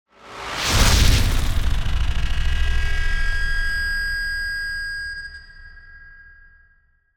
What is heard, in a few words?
whoosh and beep